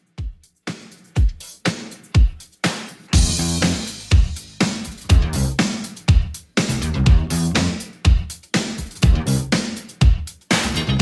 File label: 80s Redrum 122bpm